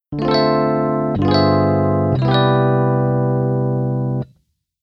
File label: Keyboard EP Soundmake